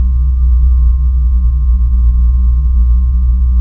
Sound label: fuelpump